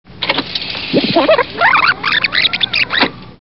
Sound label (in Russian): korotkij zvuk peremotki